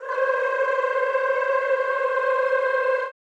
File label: CHO C4, Rrrr; Sound & Vision; Gigapack I CD 2; CHO Mmhh + Rrrr